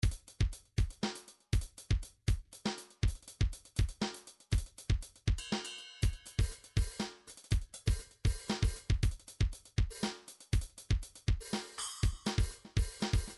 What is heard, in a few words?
midi drums percussion; Midi Vol 4 Drums & Percussion